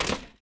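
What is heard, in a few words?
footstep Stone; droids robots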